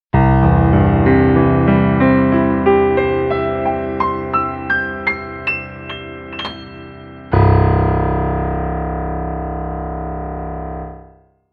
Movement In C

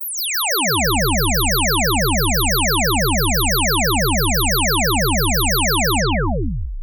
Ufo down

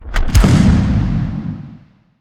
Small Explode Blast

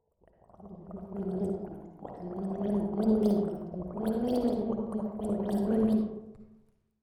Drowning Girl

